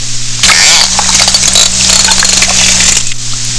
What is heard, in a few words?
Echolocation Clicks